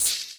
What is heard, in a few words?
Shaker; Equipped Music; Loungin House